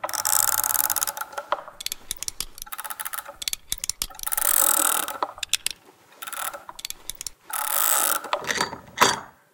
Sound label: supply opening; cstrike; pubg